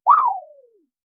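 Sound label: bounce sound effect gentle; clear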